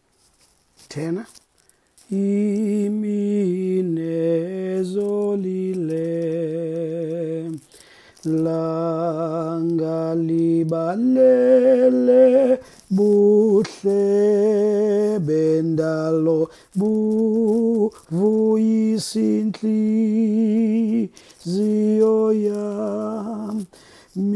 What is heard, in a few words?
tenor